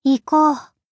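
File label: attack; hina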